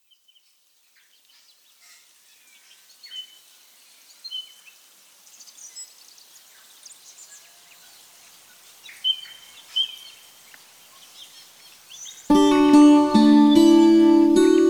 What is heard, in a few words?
ambiance strings; smorgasbord